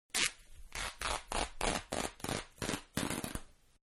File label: Shirt-Being Torn-Short Spurts; Ripping; Objects; Manhattan Production Music